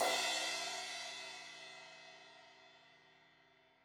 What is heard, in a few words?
Crash 16in edge, Cymbals; Stereo; Deeply Sampled Drums